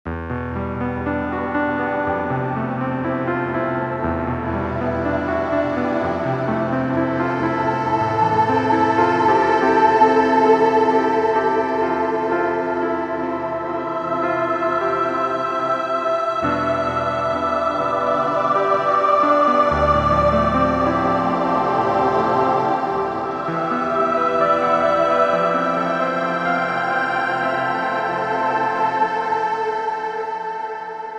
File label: all sounds from polychr